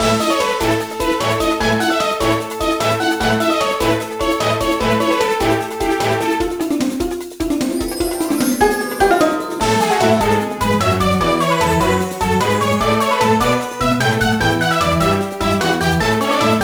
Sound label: race; Petz Horsez Family